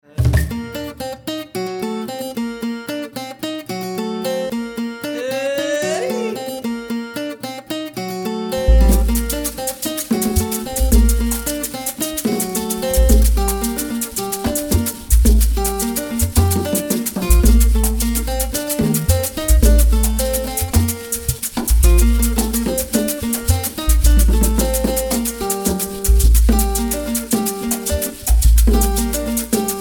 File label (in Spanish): Rumba con Changüi